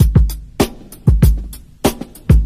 131 bpm breakbeat A key

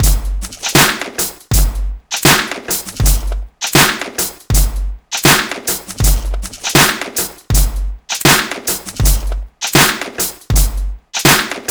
80 bpm drum beat g key; drum loop

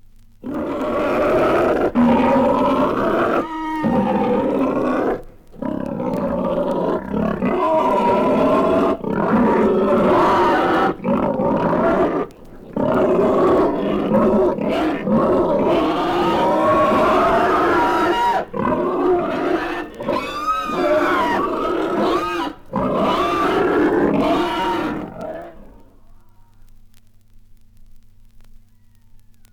monster roaring and screaming

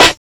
acoustic snare sample e key

